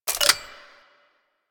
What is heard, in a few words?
Pistol Clip Empty Mech A; Bard